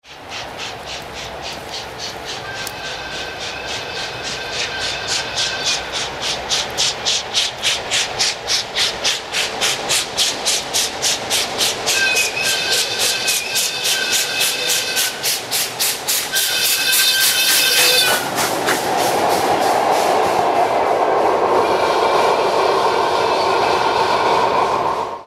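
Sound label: steam locomotive reduces speed when passing through a station using steam cutoffs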